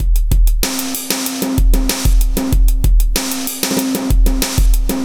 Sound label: kit; Sound Effect Library